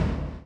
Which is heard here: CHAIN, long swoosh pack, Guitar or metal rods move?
CHAIN